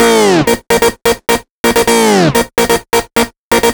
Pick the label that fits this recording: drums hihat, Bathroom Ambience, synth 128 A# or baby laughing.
synth 128 A#